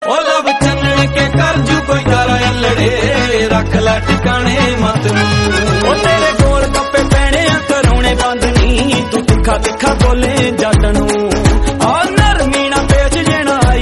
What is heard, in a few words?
punjabi